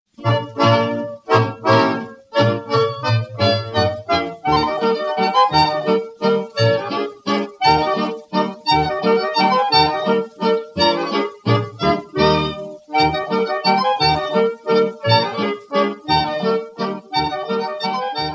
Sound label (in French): Polska - Estonie